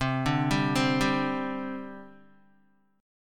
C Suspended 2nd, C, down, Guitar; Csus2 arpeggiated

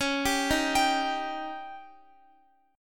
Db Suspended 2nd Flat 5th, Guitar; arpeggiated; x,x,13,12,15,15

